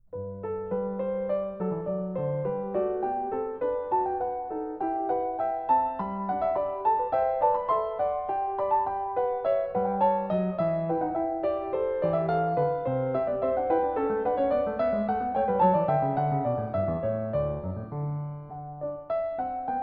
Clav. (Binaural); Goldberg Variations, BWV 988 Variation 9 a 1; Canone alla Terza; Bach

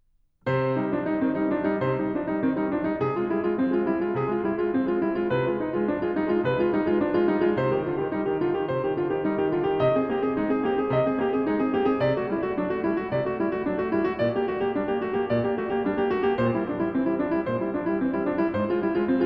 Praeludium c-Moll; BWV 847; Bach; Das Wohltemperierte Clavier; Andras Schiff; ECM

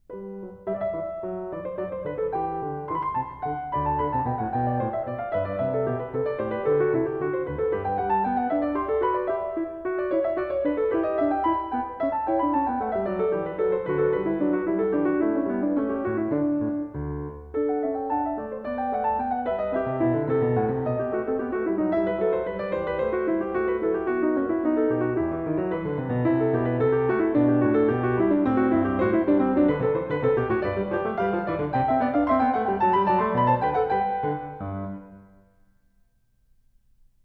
Clav.; Stereo; Goldberg Variations, BWV 988 Variation 2 a 1; Bach

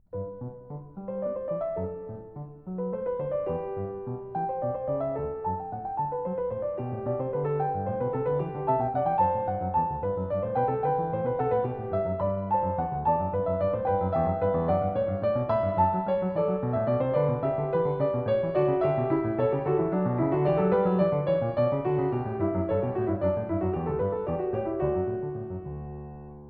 Clav. Canone all'Unisono (Binaural); Goldberg Variations, BWV 988 Variation 3 a 1; Bach

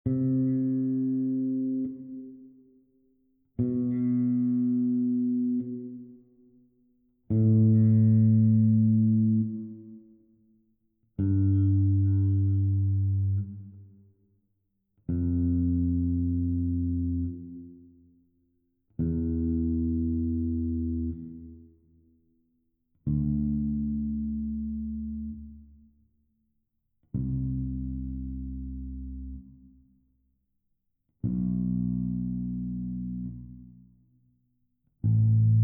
Electric Bass Guitar Scale; Audiophile; 오디오 체크 사운드

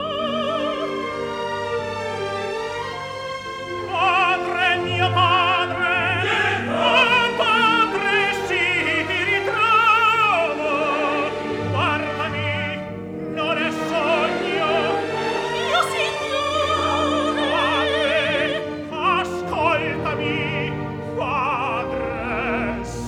Crowd; Padre! Mio Padre!; Calaf, Liù, Timur; Puccini; Turandot

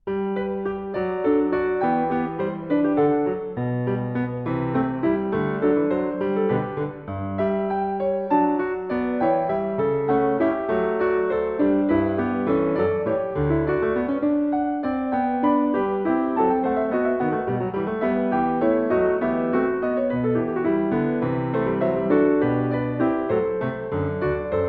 Clav. (Stereo); Goldberg Variations, BWV 988 Variation 4 a 1; Bach

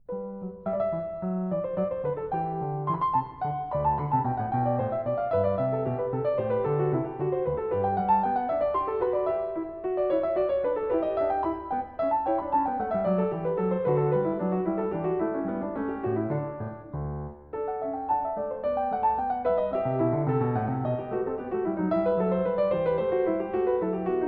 Clav. (Binaural); Bach

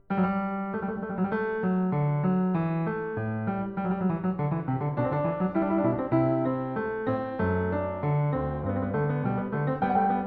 Clav. Fughetta (Binaural); Goldberg Variations, BWV 988 Variation 10 a 1; Bach

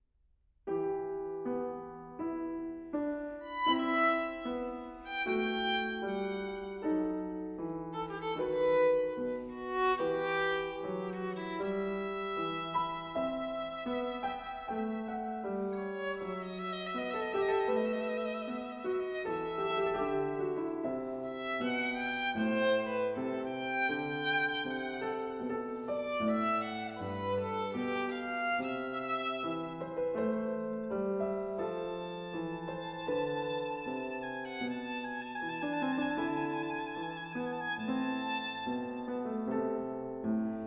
Sonata No. 6 in G major, Largo, violin, piano; Bach; Michelle Makarski violin, Keith Jarrett piano; ECM; BWV 1019

